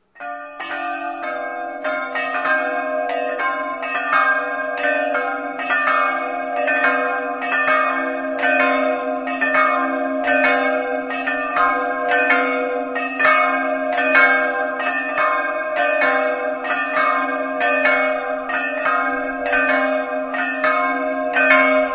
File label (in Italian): laipacco, campane